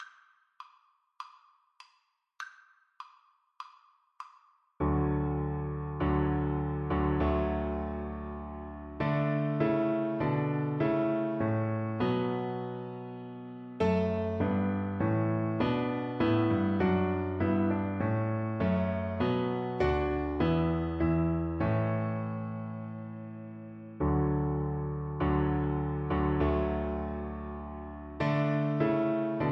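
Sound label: March D VLC karaoke, Cello; Handel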